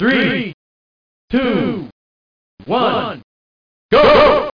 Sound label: COUNTDOWN